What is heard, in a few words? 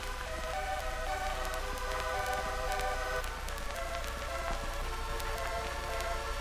waltz; ゆめ2っき